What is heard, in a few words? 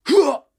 Miner Attack